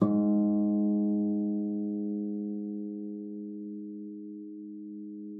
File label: FLAG G3, harp; Xsample VOL-8; HARP+FX